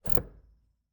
Cabin, outer doors, double doors, tension, latches, wooden, screens, slide bolt, wide; Eiravaein Works; Vaeyan IV